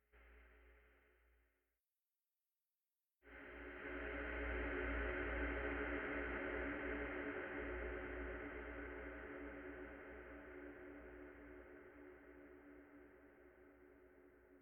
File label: Surround Dronetail